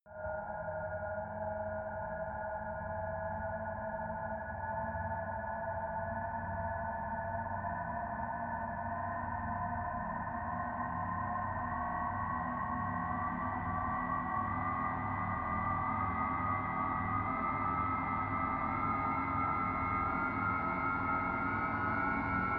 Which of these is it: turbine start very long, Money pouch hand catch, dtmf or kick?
turbine start very long